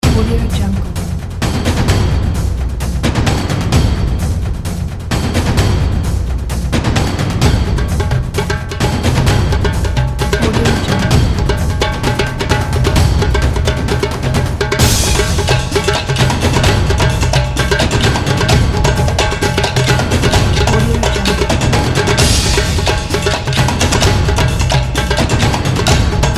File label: epic drums